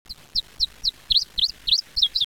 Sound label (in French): pouillot, sons